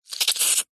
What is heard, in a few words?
hospital syringe squirt gel medicine into plastic container